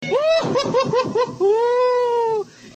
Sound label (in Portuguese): Risada Do Coringa